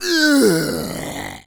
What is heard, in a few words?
pain, Zombies; zps; Z Cop